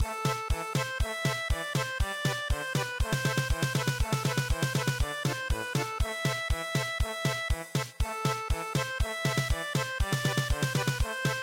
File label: March German Polka; midi; traditional